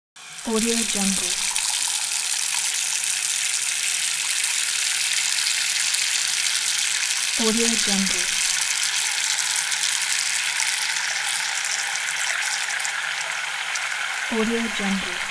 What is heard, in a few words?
water tap